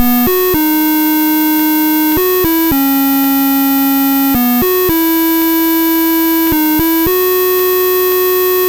Apple II Synth, Chiptune; Sonic Foundry (Sony Creative Software)

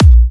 A#, Kicks; markus hakala

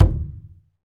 Skin Drums; Roland LCDP14 Africa VOL-2; Af.Hand Drm2

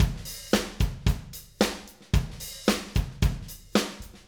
Acoustic Live Drum

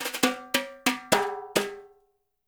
BRUSH TIMB; Spectrasonics; Liquid Grooves 1 & 2

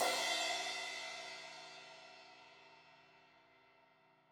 Crash 16in edge, Cymbals; Deeply Sampled Drums

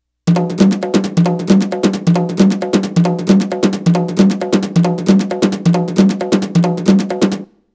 Arabic Rhythms

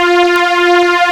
ARP F5; Kid Nepro; Elite

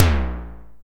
MKS TOM LO, TECHNO; Drums In Your FACE!

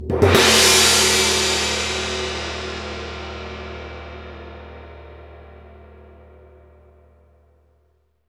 Gongs; CYM; SB Gongs

